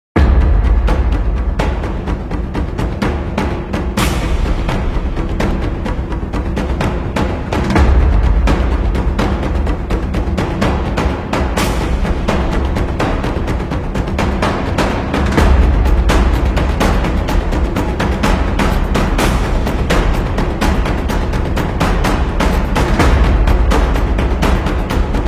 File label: Epic War Drums Music